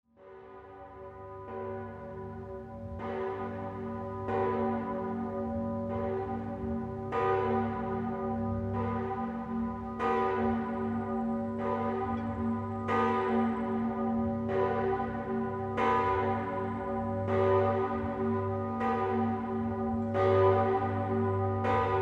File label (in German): Kaiserglocke; Größte Glocke des Domgeläutes; Dom zu Speyer; CD-Veröffentlichungen aus dem Dom zu Speyer